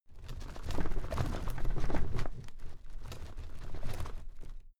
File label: drawer cabinet paper rattle short